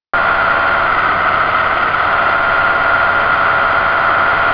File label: tetra pmr